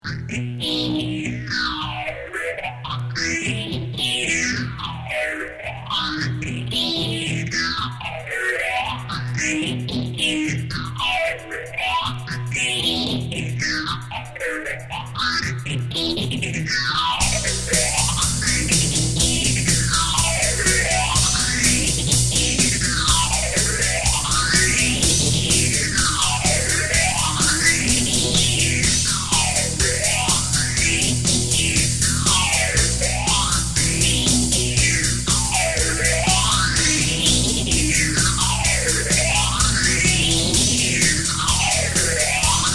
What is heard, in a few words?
music only